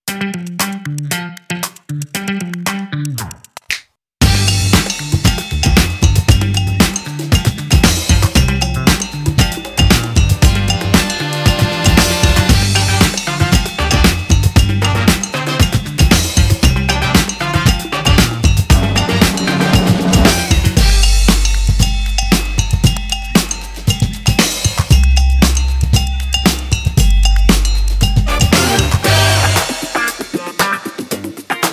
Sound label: funky junk